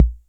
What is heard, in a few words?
Kick, TR-606 Bent Samples; Sonic Foundry (Sony Creative Software); The Electronic Music Manuscript Collection; Battery 3 Sample Kits